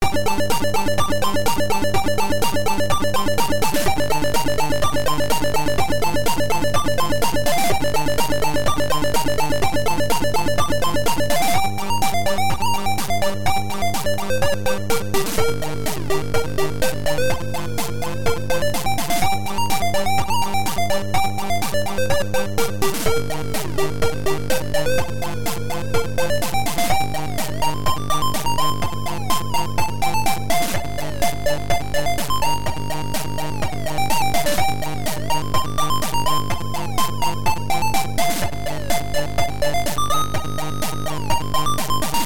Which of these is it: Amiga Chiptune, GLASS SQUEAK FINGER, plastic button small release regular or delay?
Amiga Chiptune